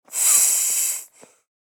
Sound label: Inflatable toy inflation single blow